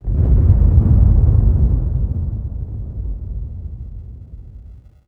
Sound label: sub boom large stereo